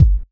Kick, Kickdrum; Equipped Music; Loungin House

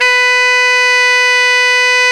SAX TENOR F, SAX Tenor ff

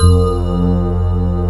SYNT F1; AKAI S6000 CD-ROM - Ultimate

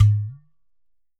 GHATAM

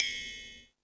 Space Drums, Drum Machines

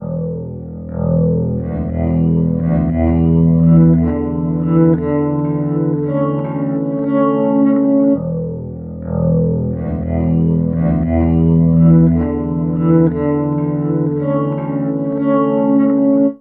Bass Guitar Loops

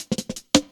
Skippy Cut, Drum Loops, Jungle; Zero-G Jungle Warfare 1, 2 & 3

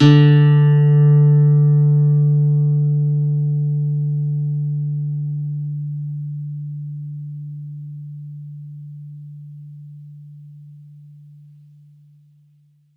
D3, Campfire Nylon guitar; Worra's Campfire Nylon

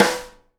SNARE RIM, Live Snares

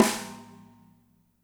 SNR, NATURAL KIT; NATURAL KIT2; Spectrasonics - Burning Grooves CD2

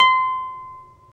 HARP C6; Roland LA; ORCHESTRA

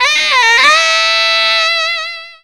FUNKY SAX; Zero-G; Funky Elements

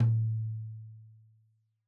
Tom2 Stereo, Deeply Sampled Drums; SMDrum

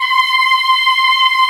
RICH VOX C5, RICH VOICE; AKAI S-Series CD-ROM Sound Library VOL-7

